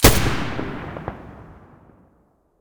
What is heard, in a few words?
muzzle g36 stereo, weapons